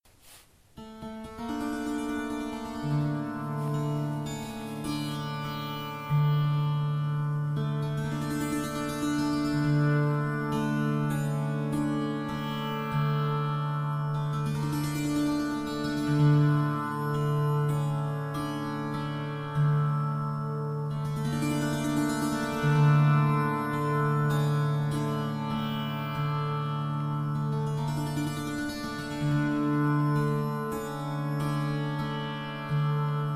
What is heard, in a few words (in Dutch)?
nada tarangini klein; ganesh muziek dag nada tarangini en ekeshwara